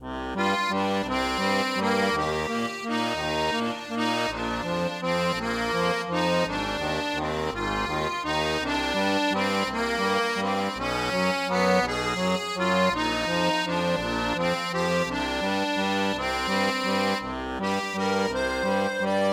tavern loop; paris-tavern-loop1; curious expedition 2 macos ps4 switch windows xbox one xbox series xs gamerip 2021

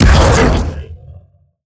Ghost Wave

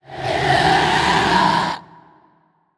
Scream; Necro S Kell

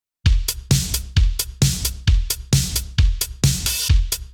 Radio Drums Stem Intro

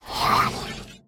Teleport In; Raquel; enemies

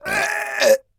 AMB China 3D Amb

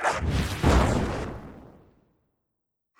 Magus Fireball

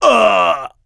Enemy Generic Human Death Crushed

